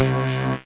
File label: Med Dist Bass Lick